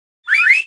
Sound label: Finger flute